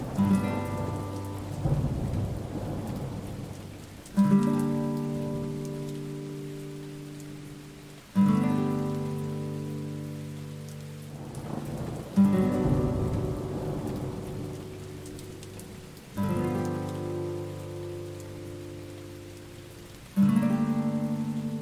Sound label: strum nylon guitar